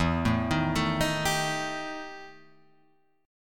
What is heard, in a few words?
E Minor Major 7th Double Flat 5th, E, down; arpeggiated; JGuitar